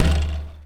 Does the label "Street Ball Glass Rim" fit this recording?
Yes